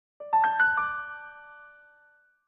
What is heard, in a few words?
Soft touch; Original Ringtones Sony Ericsson T707